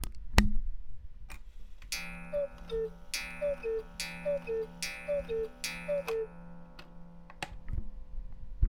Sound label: 8 Day cuckoo call, the sound of an 8 day cuckoo clock